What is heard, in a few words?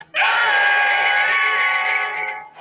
aaaaaaaaaaaaaay with dramatic impact